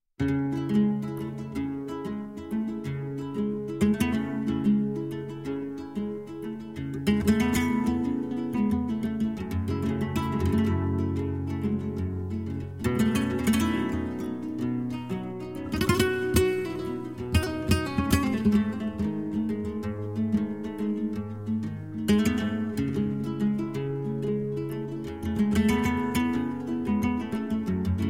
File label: Instrumental